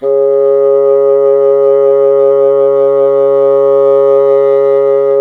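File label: wind bassoon C0; Miroslav Vitous; Solo Instruments VOL-1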